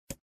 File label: menu freeplay hover; Replicant